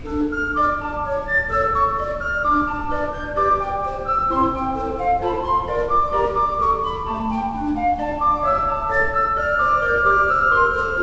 Euler II P, Orgel

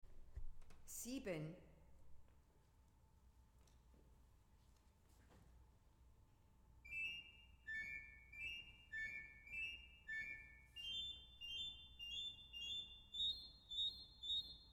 Weigle, gebrauchtorgel

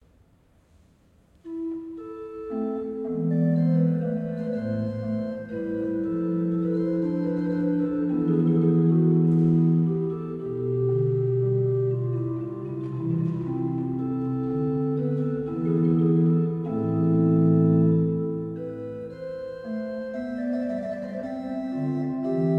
Nagels 4 I, pfeifenorgeln

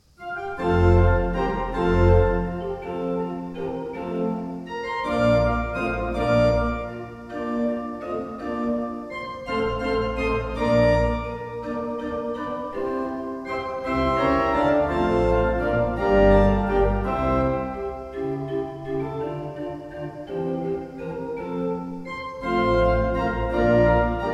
Walcker, gebrauchtorgel

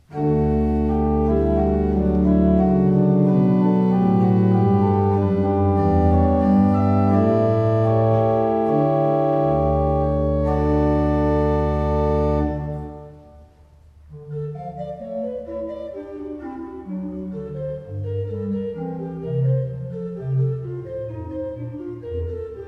Beckerath, gebrauchtorgel

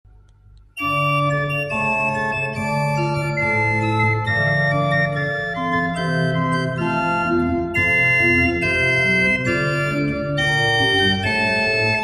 Becker 3 I P, pfeifenorgeln

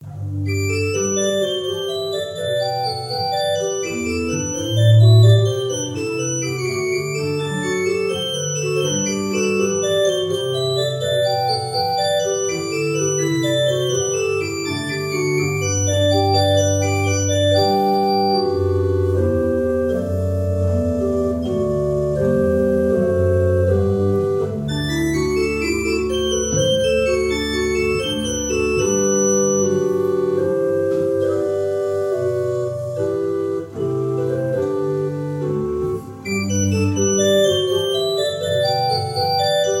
Oehms 6 II P, orgel

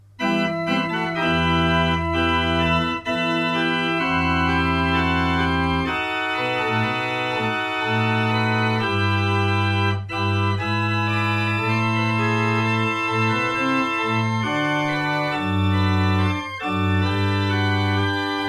Verschueren, gebrauchtorgel

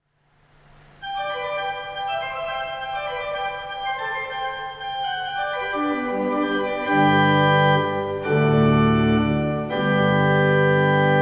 Orgel, pfeifenorgeln; HW-Prinzipal 8'+Oktave 4'+Superoktave 2'

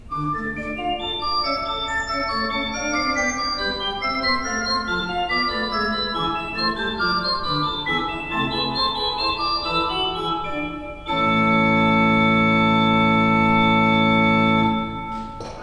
Euler II P, Orgel

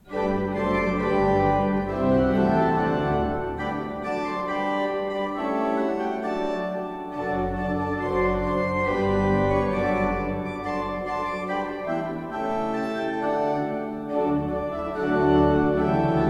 Breil, gebrauchtorgel

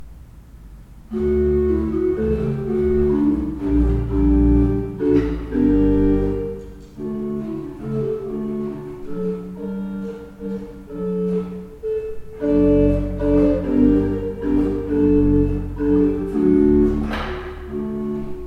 Van Rossum 10 II P, orgel

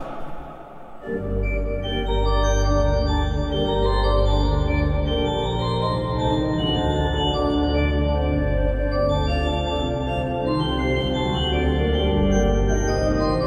Orgel, pfeifenorgeln; Klais

